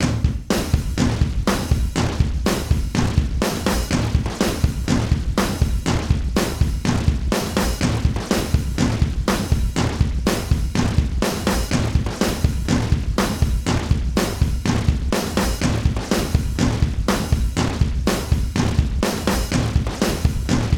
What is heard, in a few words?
acoustic drum loop; septimbral